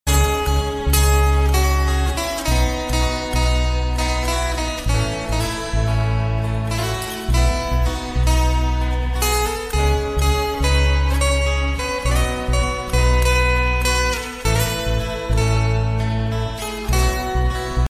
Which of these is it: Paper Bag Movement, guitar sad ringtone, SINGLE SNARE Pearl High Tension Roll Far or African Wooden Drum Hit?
guitar sad ringtone